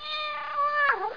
cat

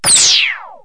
Laser Shot, Space, Battles; 16-bit; KABOOM! - Wild and Wacky Sound Software; 1,000 Sounds

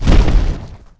footst

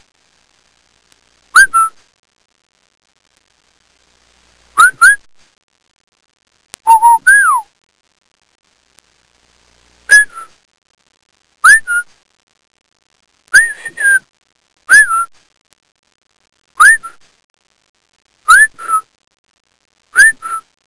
Various Whistles; Soundsation; Human

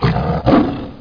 Doom 2 Explosion

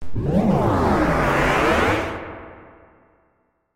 victory; brutskvorky

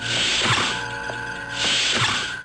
Enterprise Door; nebula

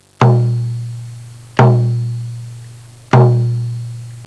indian drum sequence, percussion instruments; Sound Effects for Multimedia - Volume 1

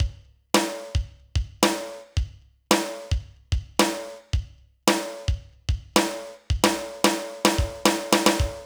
Drum Patterns; Loops; ACID MUSIC

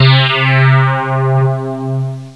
flanged, electronic sounds; Sound Effects for Multimedia - Volume 1

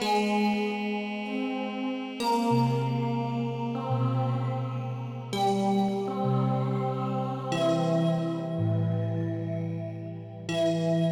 AMBI LAVA; croc

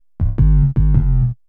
SNTHBASS D+B 160 C, Drum Bass, Bass; eJay

